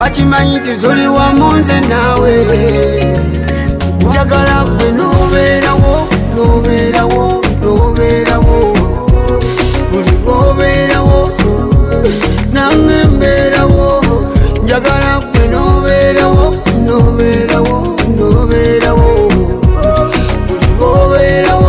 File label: ugandan music ringtone